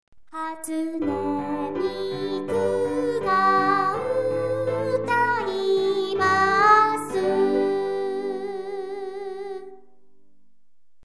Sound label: singing; miku